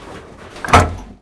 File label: Vehicle Hatches; Red Orchestra Ostfront 41-45